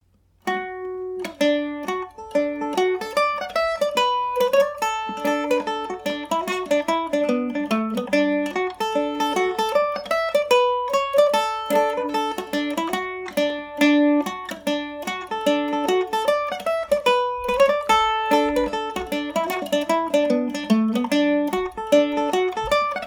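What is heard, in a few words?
D major md304, irish mandolin; The Home Ruler; Eastman MD304 mandolin (original website sound file with background noise cleaned up)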